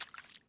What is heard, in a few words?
Foot Wet Puddle Walk